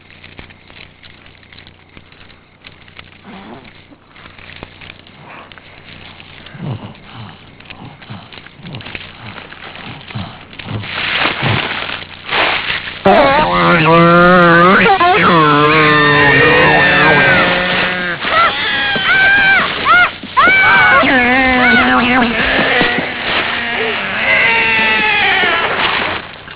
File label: aaahhh